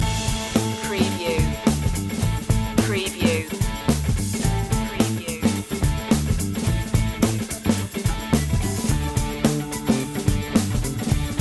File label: casino funk loop